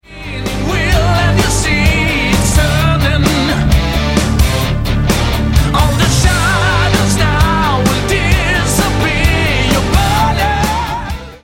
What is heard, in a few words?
Breakdown